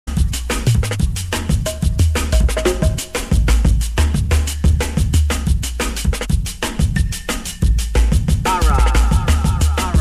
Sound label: Drum and Bass Jungle and Old Skool; UK Bass Radio